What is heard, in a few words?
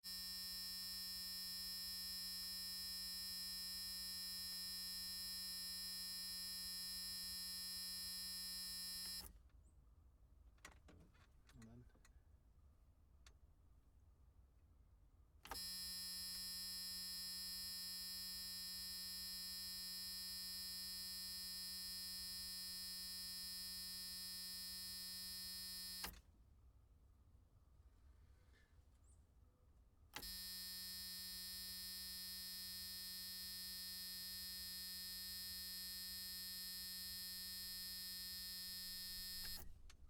long buzz